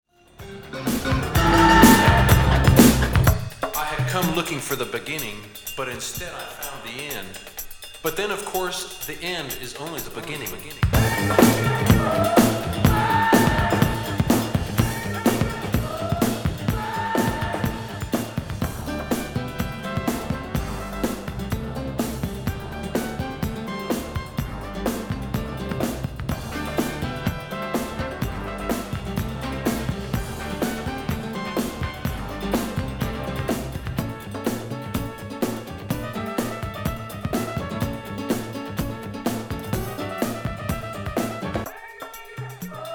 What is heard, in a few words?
GONG